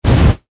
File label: Flame Thrower Burst Short; Warfare; Miscellaneous Combat